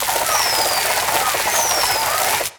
Fireworks Volcano Launch; angry birds rio

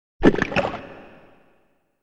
Enemy Voice (Ammonite, Anemone)

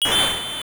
COIN; Transformer System E; arcade

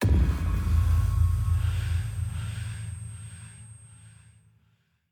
Player Knockout No Vocal; The Town Sam 2D; Devour 2021 gamerip pc macos

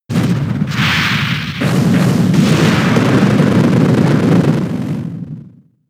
Magma Storm; pokemon sfx gen 6 attack moves xy oras

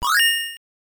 COIN; SWAT; System 1; arcade

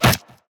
Impact; portal quest android; Rebel Skill1

